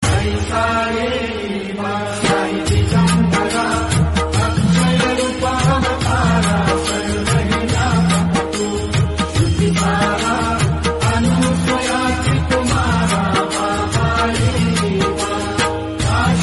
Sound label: marathi bhakti song